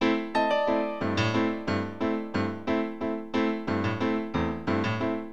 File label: PIANO, Keyboards; HipHop; LiveAct Pool 1